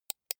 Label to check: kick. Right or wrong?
Wrong